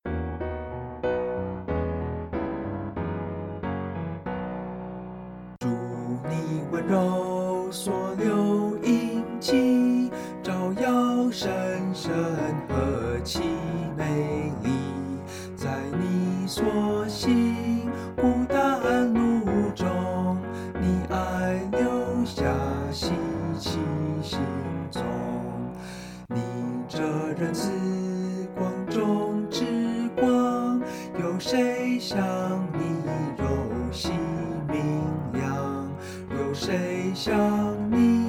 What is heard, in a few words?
vocal; Hymns; Chinese